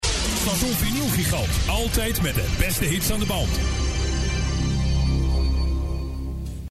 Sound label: Jingle; Audio Fragmenten; Arkan Radio 2